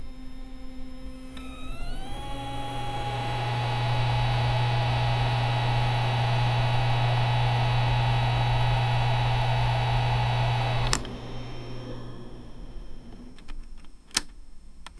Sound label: the sound of an old, computer